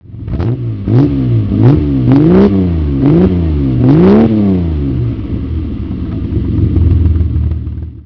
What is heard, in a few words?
250 GTE